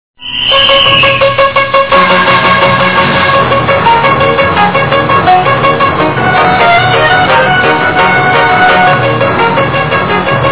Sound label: theme music; Pee-wee's Big Adventure